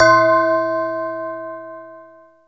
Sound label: tubular bell; SN-R8-07 Mallet